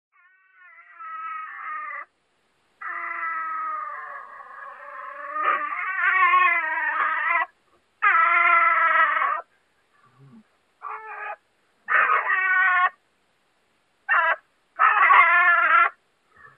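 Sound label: kunica golos, marten voice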